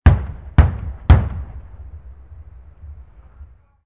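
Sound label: explosion medium; amb elements; modern warfare 2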